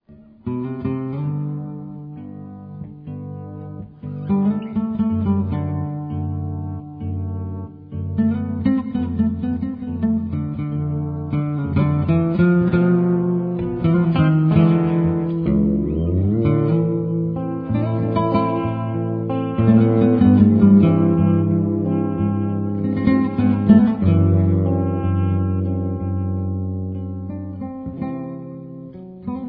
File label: Jazz meets Tango live